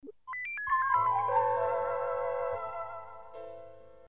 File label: Telephone